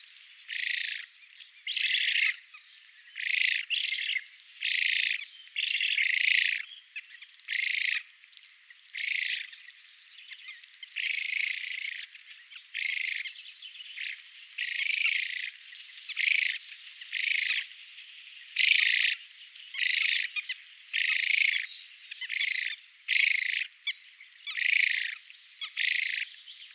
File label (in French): Spatule blanche Platalea leucorodia